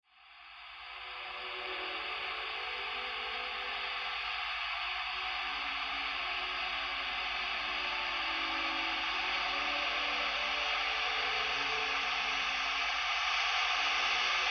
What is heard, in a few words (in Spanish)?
mexico electroacustico